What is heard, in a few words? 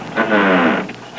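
grind gears, transportation; Web Clip Empire 150,000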